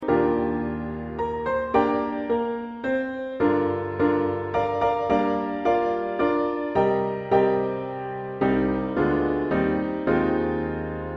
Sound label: Piano